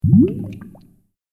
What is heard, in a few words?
Water Bubbles; Andrew Fleming